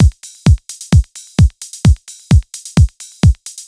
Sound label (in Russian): Taspo Drums, евродэнс